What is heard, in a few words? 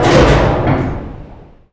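elevator run stop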